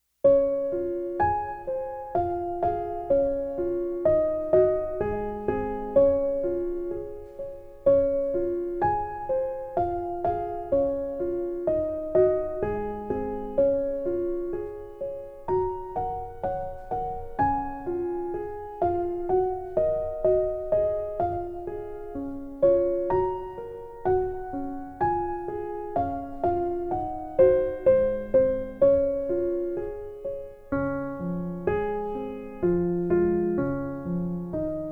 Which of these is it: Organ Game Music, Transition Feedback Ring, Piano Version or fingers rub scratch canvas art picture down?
Piano Version